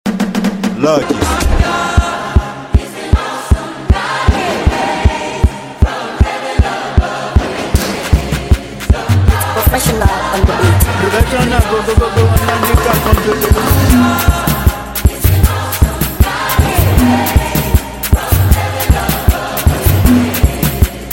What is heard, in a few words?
Professional Beat; Mara Beat